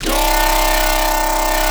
Inflexional, Noise; Cymatics; Shapeshift

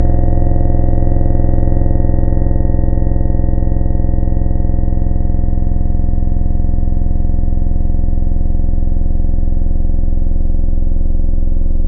Keys Digital; Instruments; Synthetic's Serum Collection; Echo Sound Works Core Tables

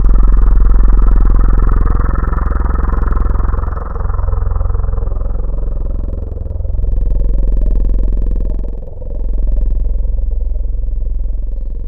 Vocal Hummer; Wavetables; Synthetic's Serum Collection; ESW Core Wavetables; Echo Sound Works Core Tables

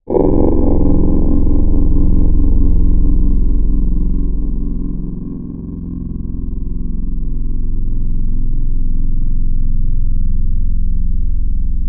Kantele; Synthetic's Serum Collection; Echo Sound Works Sphere

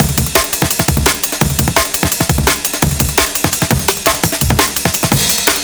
170bpm compressed, Processed breaks

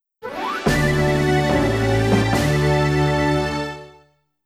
Congratulatory Message; Wii Room (Shopping Update) Soundtrack; Wii Channel

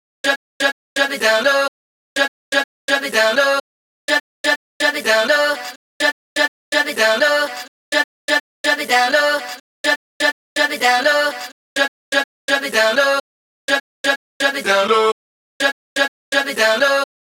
vox no back 125bpm; lolicore

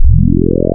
FFT Add 2nds, Digital; Synthetic's Serum Collection

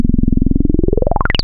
303 Acid

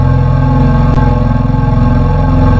Vocal Mumbles, Wavetables; Synthetic's Serum Collection; ESW Core Wavetables; Echo Sound Works Core Tables

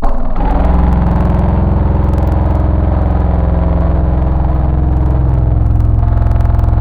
Vocal - Vocoder, wavetables; Synthetic's Serum Collection; ESW Core Wavetables; Echo Sound Works Core Tables

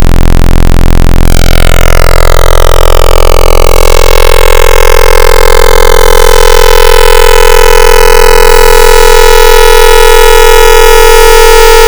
Square Harmonics; Synthetic's Serum Collection; Cymatics - Virus Wavetables; FM Wavetables

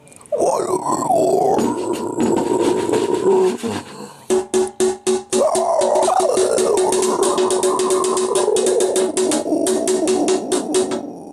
AXE SNARE

